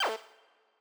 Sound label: end call